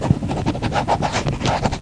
crayon; Mobiclic51

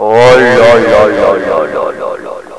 Défaite; Flip Side

